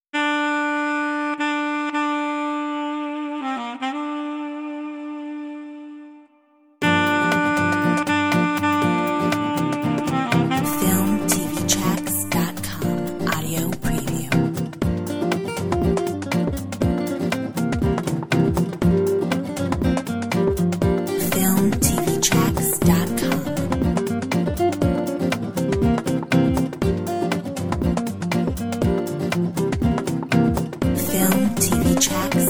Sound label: Django Swing